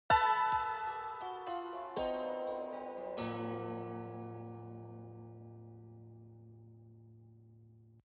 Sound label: haunted strings chromatic descent